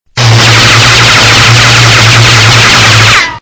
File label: watch laser